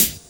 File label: CHH, Dance Drums